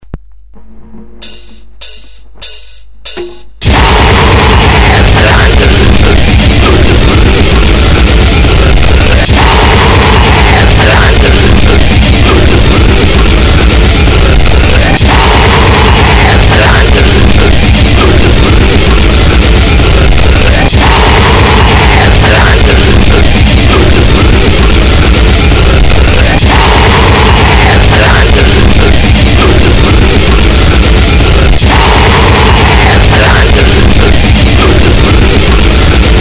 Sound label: zouk